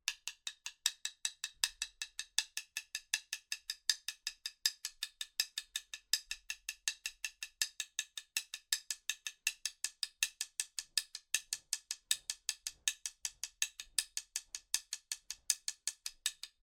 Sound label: Sticks correct